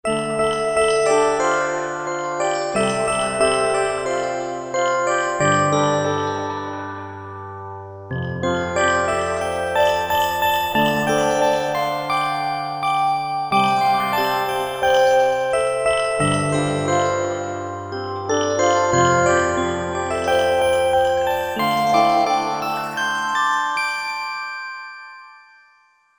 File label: Dream Chime; Korg MSC-14S Sound Effects